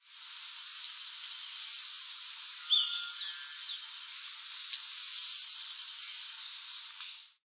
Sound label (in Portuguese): balanca-rabo-da-copa